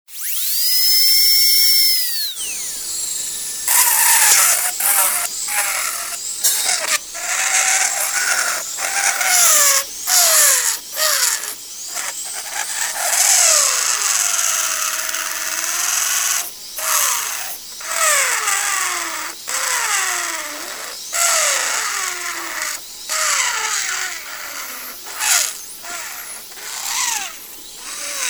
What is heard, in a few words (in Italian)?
Effetti Sonori